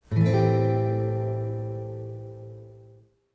a min7 good voicing, chord

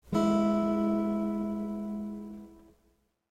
e major open 3note, chord

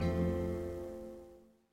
fmaj7, guitar chord

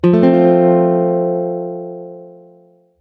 f major, chord

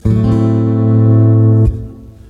d maj chords x04210, chord

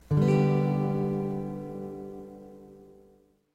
g major open 4 note

